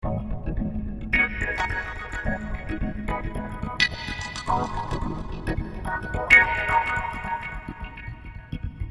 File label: Swinglefunk D 108